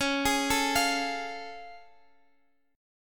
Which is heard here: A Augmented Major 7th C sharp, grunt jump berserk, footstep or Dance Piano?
A Augmented Major 7th C sharp